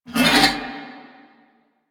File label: Ram Idle Grunt; Duviri; Animals